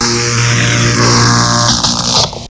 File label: Electricity; DINAMATION; HANGAR